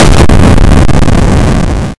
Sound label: quake; project hawk